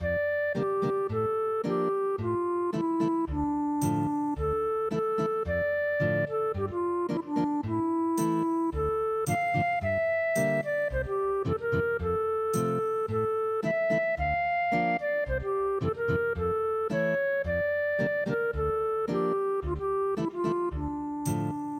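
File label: foreign guitar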